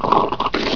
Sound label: slurp up